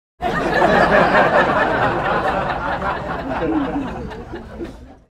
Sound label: sound of laughter